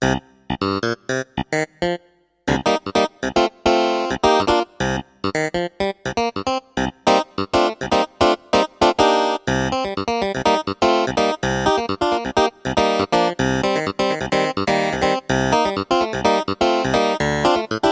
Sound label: Clavinet